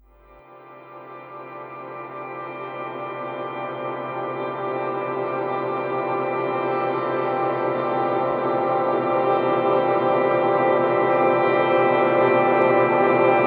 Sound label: Lull (Guitar Infinite Reverb)